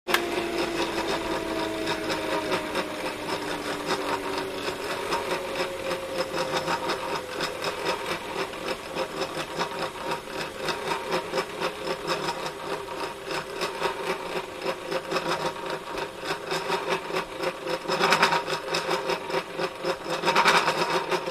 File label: Juicer Broken Off Ba, variable speed; Off With Bad Squeals